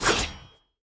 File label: Enemy Attack Basic Cast; bloom minigame